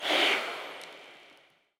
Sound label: Breath